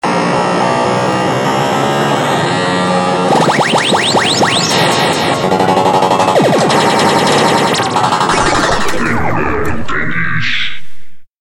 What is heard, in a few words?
videogame jingle